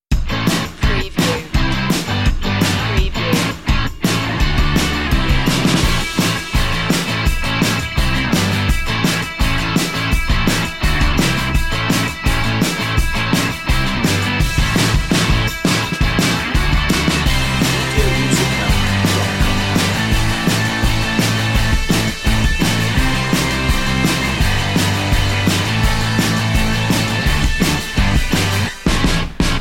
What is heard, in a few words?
indie party